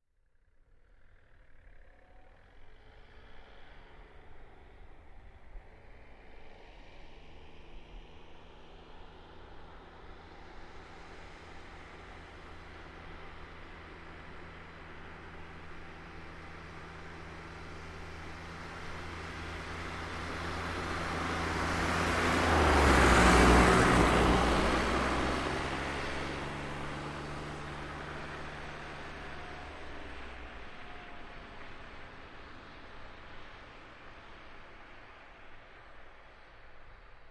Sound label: Car Smart Car By Med; 2005 Smartcar Fortwo; Vehicles; Big Room Sound